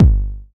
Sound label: verby bass drum g key